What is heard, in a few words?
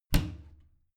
bathroom cabinet creak close heavy; objekte; tueren